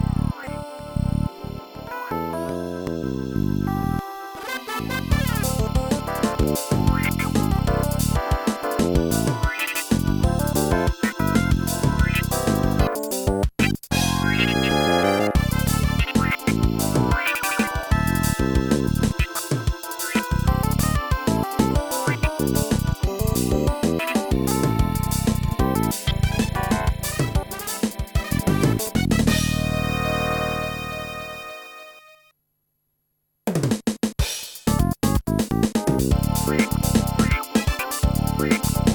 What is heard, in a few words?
mod acid jazz; TP95